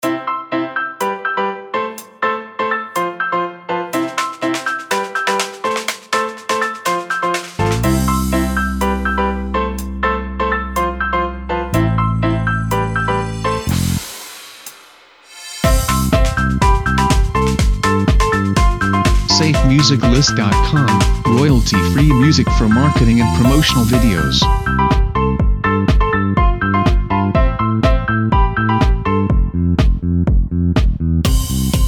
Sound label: Playful; SafeMusicList